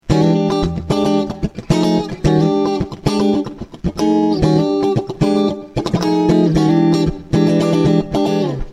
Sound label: funky